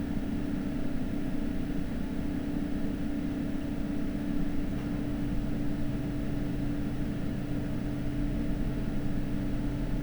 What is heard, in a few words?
Max RPM